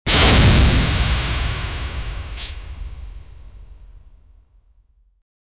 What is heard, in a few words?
a dramatic ninja smoke; action-packed